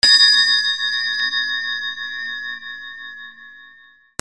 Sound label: BELLS